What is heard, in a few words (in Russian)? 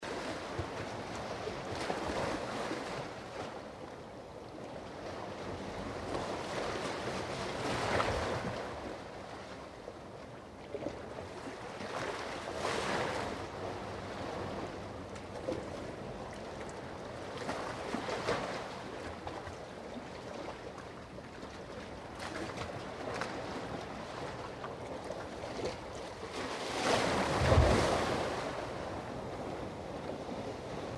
volni skali